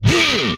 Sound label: Attack, Vocal; retro arcade; MMRetroArcadeSoundsPack